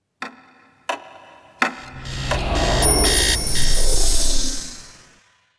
clocks

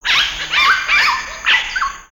Bird Amazon One shots; Lego; ambience

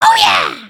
Thumbs Up, emotes

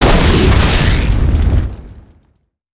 Katana Strike; weapons; aronwk collection; ndaudio

